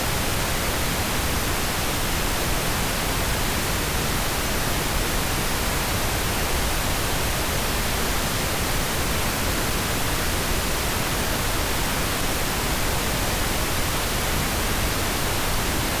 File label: pink noise